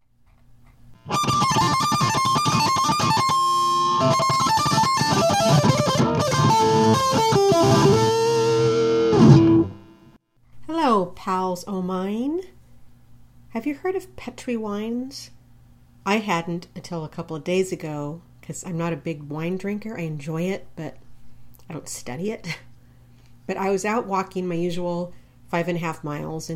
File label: birds and caves